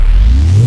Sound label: force field up; Army Men TiS